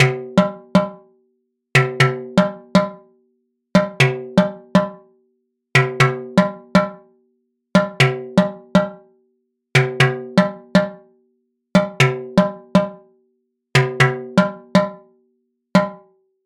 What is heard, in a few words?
echoes false start dry; aas; objeq delay